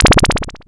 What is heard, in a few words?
machine gun; Zero-G Ambient 1